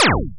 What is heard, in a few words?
Laser